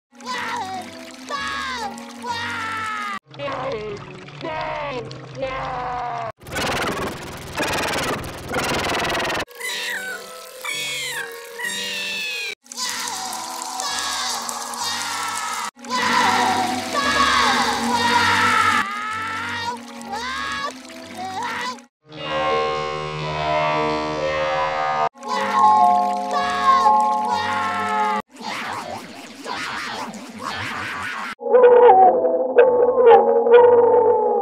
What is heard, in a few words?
crying sound variations; 11 SpongeBob Crying Sound Variations in 35 Seconds